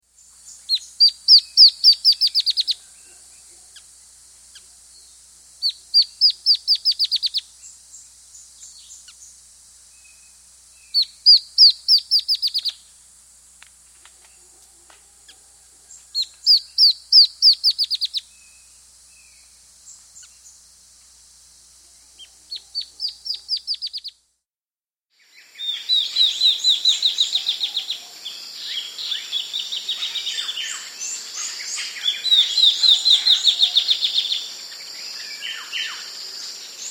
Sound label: Dot-Winged Antwren, Nature's Songs, S. America